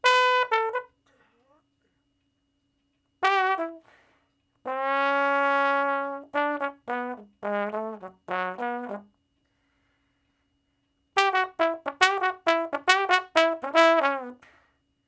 Trombone Slo Blus (E I, IV, I, I, IV), Solos, Horncraft for R&B; Crimson Blue and Fabulous Horncraft 4 RnB